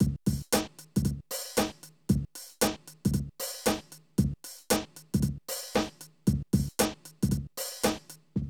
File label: Drum loops, Hip-hop